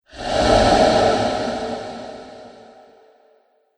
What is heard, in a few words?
Pyramid Phantom, ghost sound effects